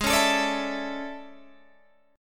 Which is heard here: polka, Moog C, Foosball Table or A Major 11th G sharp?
A Major 11th G sharp